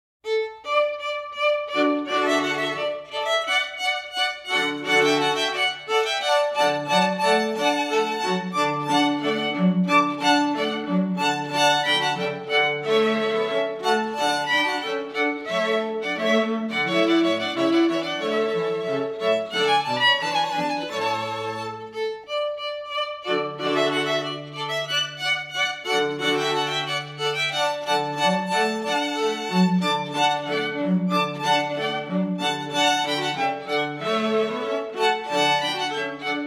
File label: Royal Fireworks Music Overture, String Quartet